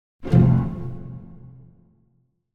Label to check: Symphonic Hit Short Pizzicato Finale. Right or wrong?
Right